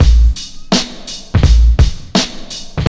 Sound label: TRIP 90, trip loop